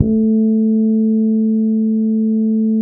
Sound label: bass, A 3; Spectrasonics; Bass Legends CD 1